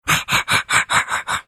trying laugh like; muttley

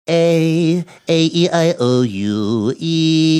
singing a a e i o u e

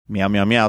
meow meow meow

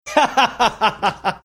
laughing

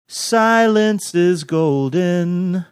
singing

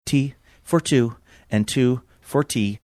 singing

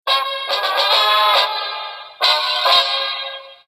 ditty

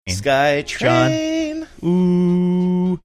singing skytrain

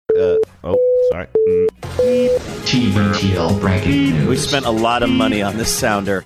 beeping booping; along with tbtl bragging news

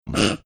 snorting

